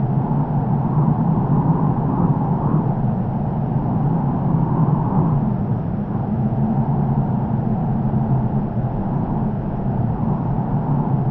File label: Blocking Area Wind Loop; Journey